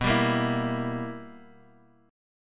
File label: B Major 7th Suspended 4th Sharp 5th B Guitar DADGAD